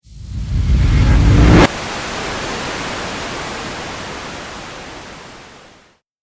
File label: Trailer Hit White Noise; Trailer Sound Effects